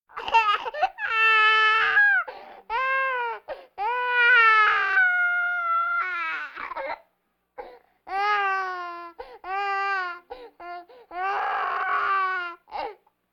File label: Vocal effects breathing Moaning Baby